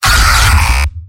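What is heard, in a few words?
pain severe, male; demoman; tf2; garrysmod; mvm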